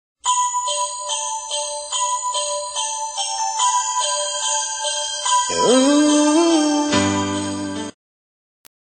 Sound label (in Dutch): Schudden bellenboom